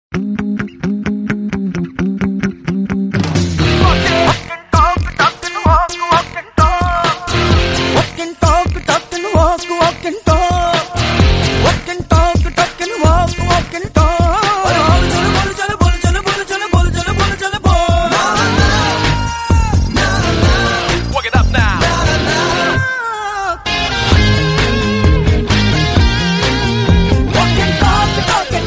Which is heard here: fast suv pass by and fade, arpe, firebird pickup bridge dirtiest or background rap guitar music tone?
background rap guitar music tone